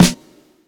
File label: Snares